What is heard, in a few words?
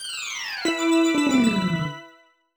Mini Game Lose; mario vs. donkey kong mini land mayhem